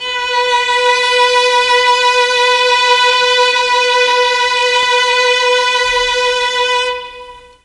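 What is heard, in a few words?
B2 violins, mellotron; 3 VLNS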